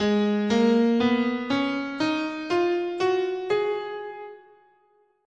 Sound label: Ab Dorian, scale